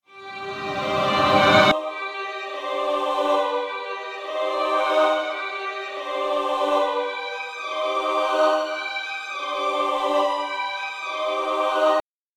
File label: bgm